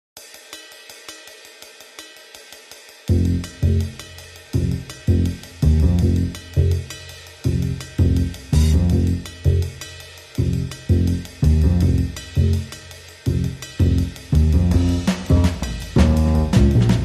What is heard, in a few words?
Drums and Bass Only